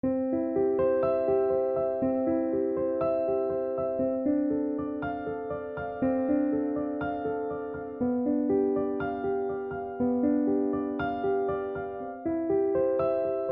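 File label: Classic, Pianoteq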